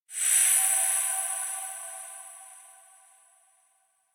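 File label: electronic pendant emit light; anemoi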